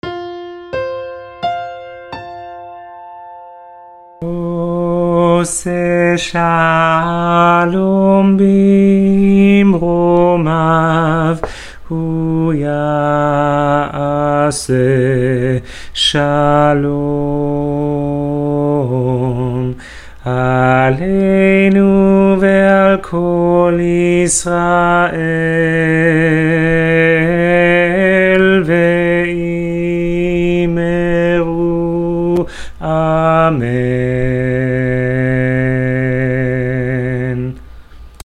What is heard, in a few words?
spanish alto